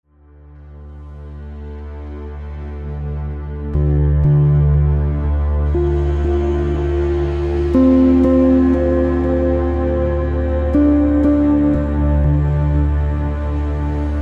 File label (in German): Entspannungsmusik für Babys